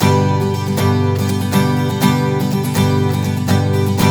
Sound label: Blues Guitar Samples; ILIO; Fretworks